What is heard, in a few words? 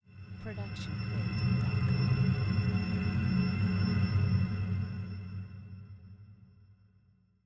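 sci fi ambience; soundscrate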